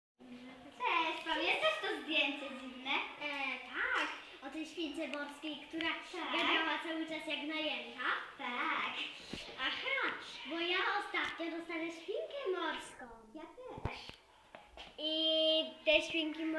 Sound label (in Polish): odgłosy w szkole